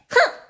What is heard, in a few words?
punch hoo; sm64coopdx; char-select-extra-chars; daisy